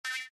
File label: Ninja Jump